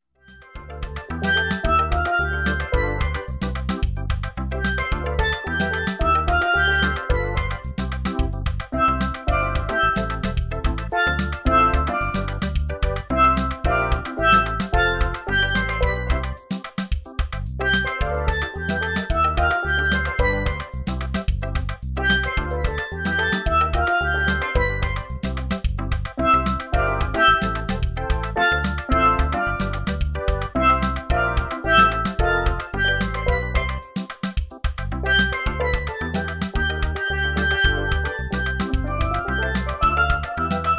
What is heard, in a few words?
Steel Drum